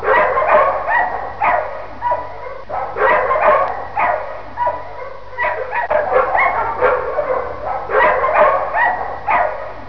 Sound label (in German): Hund